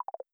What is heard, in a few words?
close